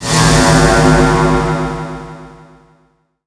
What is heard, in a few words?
spawn loud